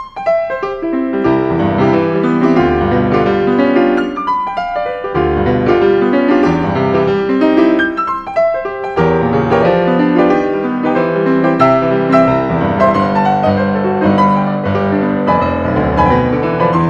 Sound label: sonata in c minor ringtone